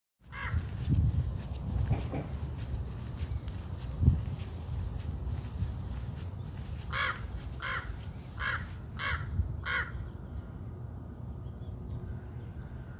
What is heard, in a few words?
american crow spring